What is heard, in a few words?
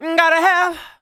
vocals sung F#; Techno Trance Essentials